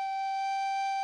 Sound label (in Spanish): flauta